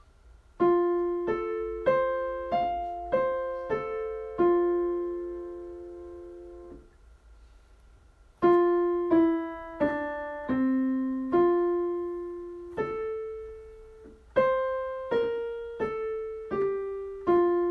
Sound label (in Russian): Диктант фа мажор